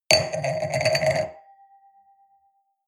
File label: wine glass place table hard wobble; berufe; soundpool